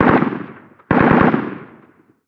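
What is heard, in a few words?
gun m16 distant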